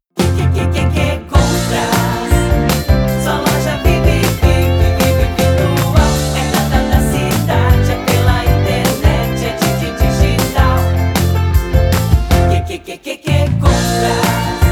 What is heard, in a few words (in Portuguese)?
Jingle Black Friday; Q Compras 2 Black Friday